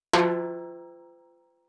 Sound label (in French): Timbales aigu rim shot